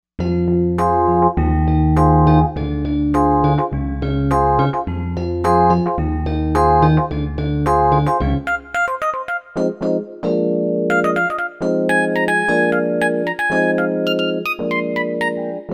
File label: Stone EP